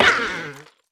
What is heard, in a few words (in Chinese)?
犰狳死亡声